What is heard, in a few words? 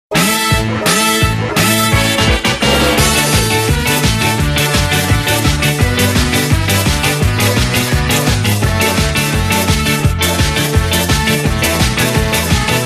Slide Theme; M&SATROG